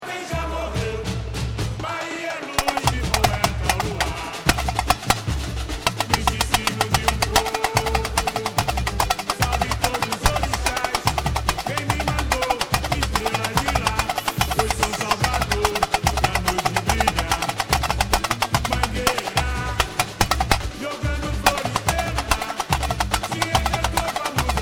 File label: tamborim